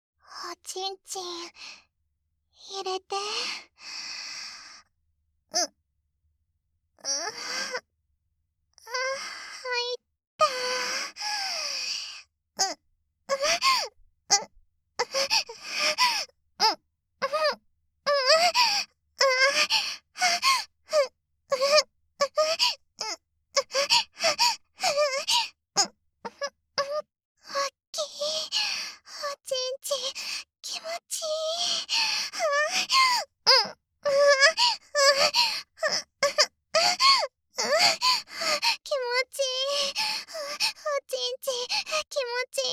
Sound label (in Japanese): 挿入 喘ぎ; SEなし